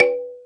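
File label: Jungle Percussion, Drum Machines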